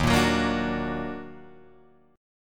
Eb Minor Major 7th Eb down Guitar Open G, chord

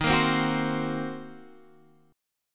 D sharp Minor 6th D sharp down Guitar Standard; strummed; JGuitar

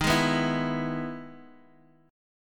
E-Major D sharp

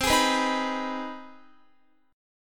C Minor Major 7th Sharp 5th, Guitar; strummed; CmM7#5; x,15,18,16,15,18; 4E,4A,5D,5G,5C,6F

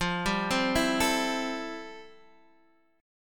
A-Minor 7th F; Am7/F arpeggiated; JGuitar

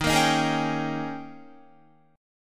Eb 11th Eb, down, Guitar, 4E,4A,5D,5G,5C,6F; strummed; JGuitar

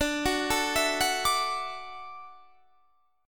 D-Major Add 9th D down Guitar Standard; arpeggiated; JGuitar

